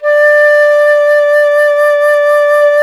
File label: FLT FLTD, Flute; Miroslav Vitous - Solo Instruments VOL-2